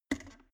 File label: plastic water boiler open lid soft